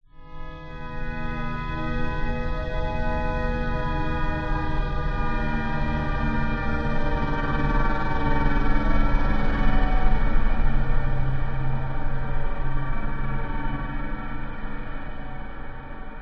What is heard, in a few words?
horror swell stuttering passing eerie dark chilling